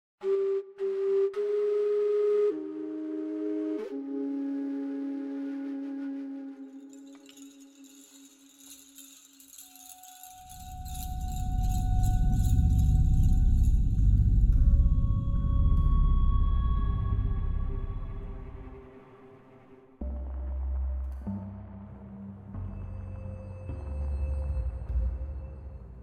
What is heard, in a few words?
the swamp, music packs